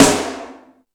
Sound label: Hip House, Drum Machines E-MU Pro-Cussion